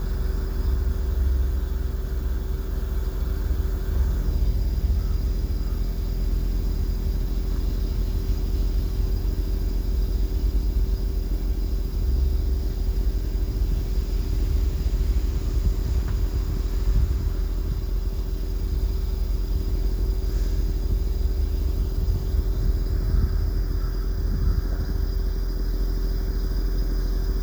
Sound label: heatpump